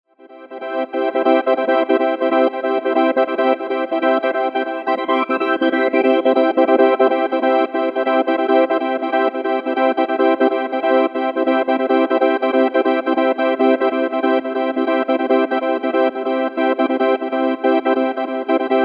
Bed Synth Chopper Flange, Bed Rhythmic Soft Over Chords; Bed Sound Effects; Blastwave; Production Element Imaging Element Accent Transition